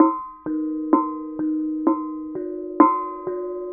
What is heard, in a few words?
KENONG; Spectrasonics; Heart of Asia; KENONG PH